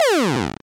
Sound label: game over